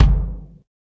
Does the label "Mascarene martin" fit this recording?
No